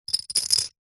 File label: leisure game plastic discs stacking; connect 4